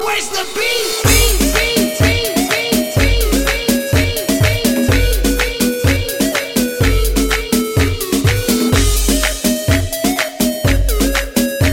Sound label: Bubbling Bass